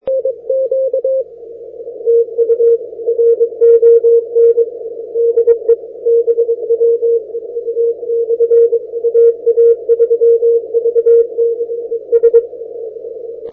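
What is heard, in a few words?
80m long path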